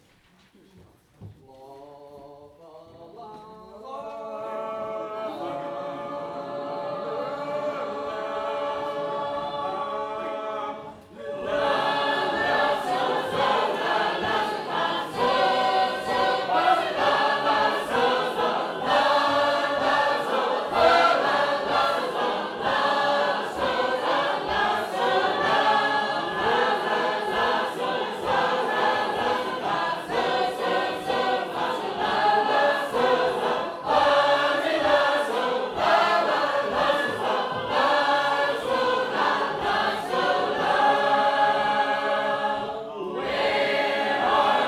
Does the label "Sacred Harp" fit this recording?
Yes